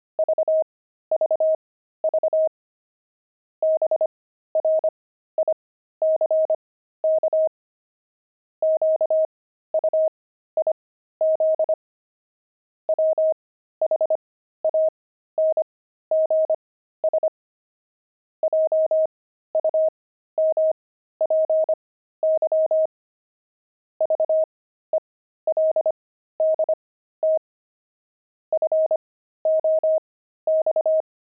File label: pangram, intermediate pangrams files; veldt